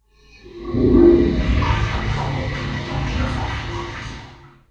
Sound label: Amb Canalisation Amb One Shot Far